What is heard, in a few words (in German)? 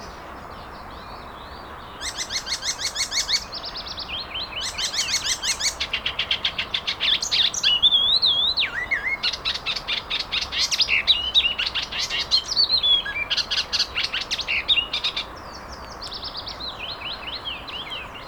Gelbspoetter; Garching M; Daniel Honold